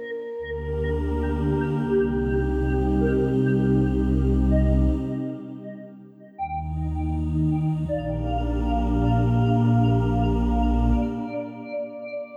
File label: Lush Pads; BB 2HTC Samples Vol 4